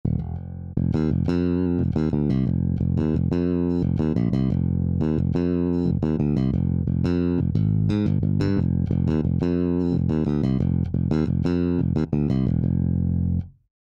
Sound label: Electric Bass - Flat Bass